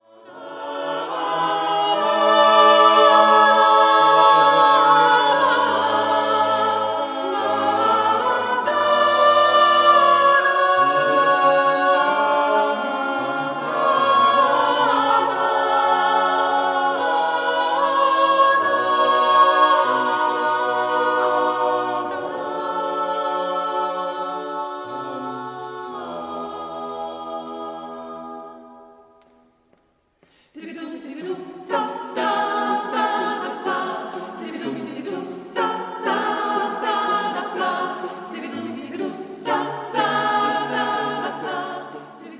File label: Music; kirchenmusik kaarst; choere